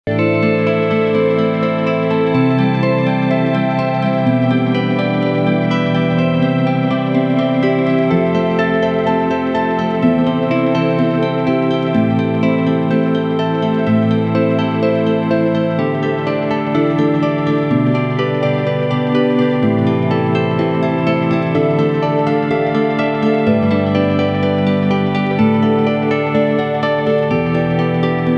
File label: music fx compose an instrumental track featuring guita